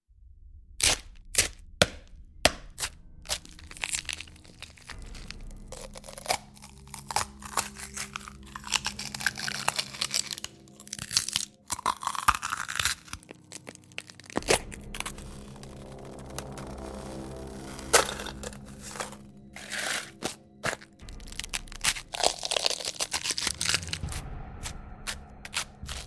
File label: Gore